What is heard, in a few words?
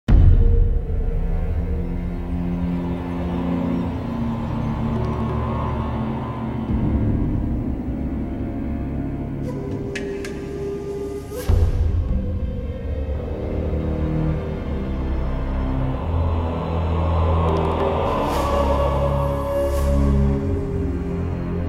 Industrial Jungle Ambient